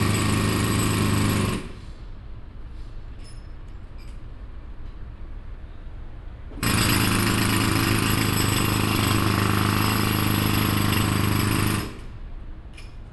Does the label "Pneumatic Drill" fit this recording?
Yes